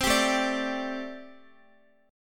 A Minor 11th, Guitar; Am11 strummed; x,x,12,12,9,10; JGuitar

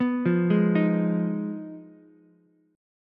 G sharp Minor 6th G sharp down Ukulele; G#m6 arpeggiated; JGuitar

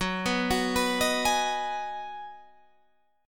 B 6th F sharp, guitar chord

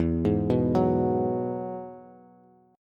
E Major Add 9th, Bass; arpeggiated; JGuitar

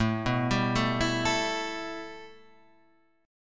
A 7th Suspended 2nd A; A7sus2 Chord {5 2 5 2 5 5} arpeggiated

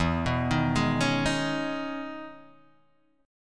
E Major 13th down Guitar DADGAD

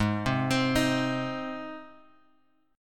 G sharp Major G sharp, chord; G# Chord {4 3 X 5 4 X} arpeggiated

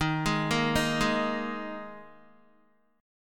Eb Suspended 4th Eb Guitar 4F 4A 5C 5G 5C 6E; Ebsus4 arpeggiated; JGuitar

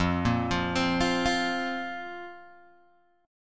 F Suspended 4th F, Guitar Open G

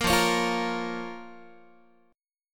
F Suspended 4th Sharp 5th, down, Guitar; strummed; 13,13,16,15,14,x; JGuitar

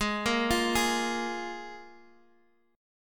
F Minor Double Flat 5th, G sharp

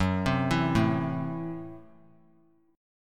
Gb Minor 7th Flat 5th Gb, guitar; arpeggiated; 18,19,19,19; 3C,3F,3A,3D; JGuitar

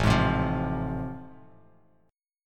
A Minor Major 7th Sharp 5th A, Bass Standard; strummed; AmM7#5; JGuitar

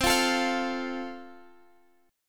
C 6th Suspended 4th C, chord

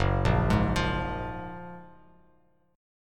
Gb Major 9th, down, Bass Standard; GbM9; arpeggiated; JGuitar

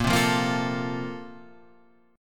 Bb Major 7th Suspended 2nd, Guitar, Standard; 6,3,3,3,6,5; strummed

